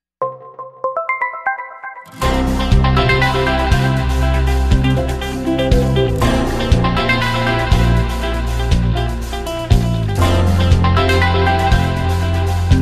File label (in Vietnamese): Nhac chuong doc quyen cua samsung